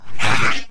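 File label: Caiman Giant attack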